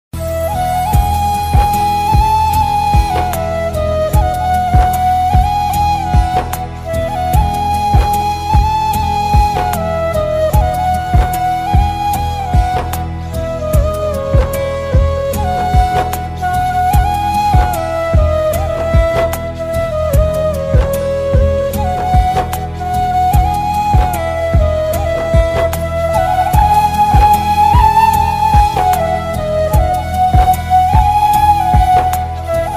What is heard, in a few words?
Sweet Bansuri Ringtone